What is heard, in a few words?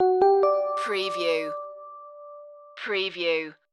idents ident